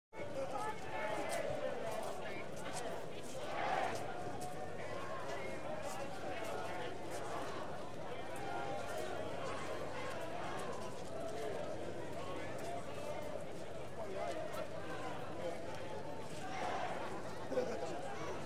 Street Crowds - Industrial Dispute, Chanting Among Marchers; Human Crowds, Children & Footsteps